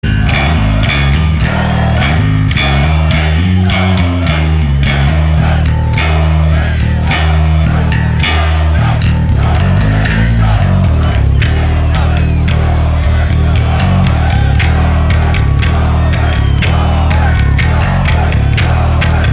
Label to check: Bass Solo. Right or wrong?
Right